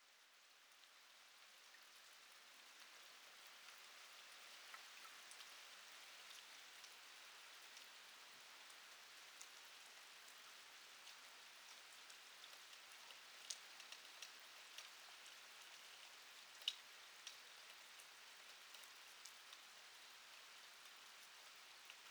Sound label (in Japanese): 雨音